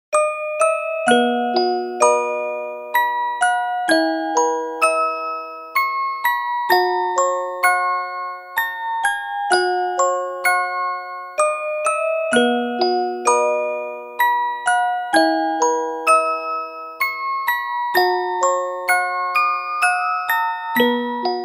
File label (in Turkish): santral fon muzikleri